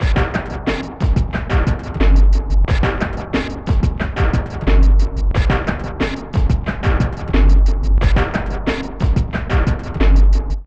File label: wide tunnelrun 090bpm, downbeat dance grooves; Zero-G